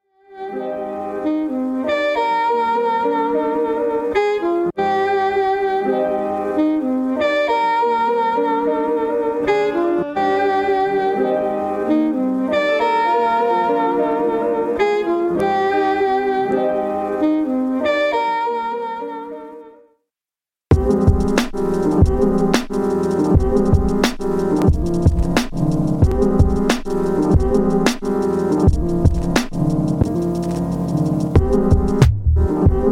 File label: sax